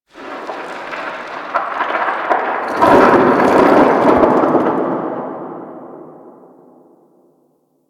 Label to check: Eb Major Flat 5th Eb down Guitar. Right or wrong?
Wrong